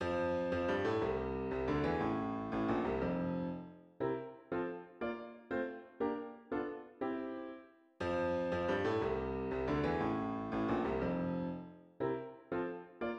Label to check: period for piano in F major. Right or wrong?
Right